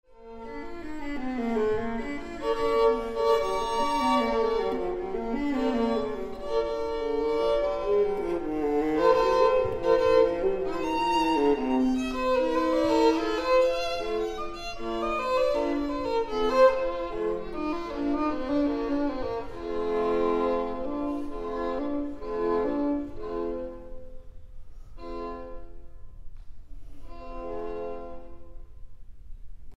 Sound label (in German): Viola mit Violine Duo; KV424; Lindsberger